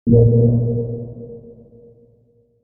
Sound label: Space Style Ambient Noise, Eerie; Sci-Fi; Stuart Duffield